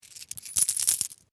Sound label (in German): Muenzen